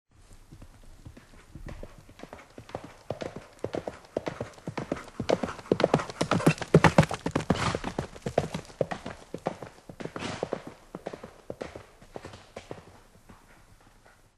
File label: horse; WMBonusXP